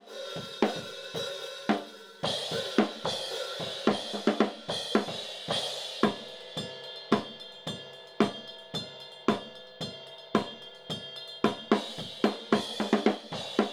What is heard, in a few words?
drum mic example; Turner; Example 3 Turner mic solo'd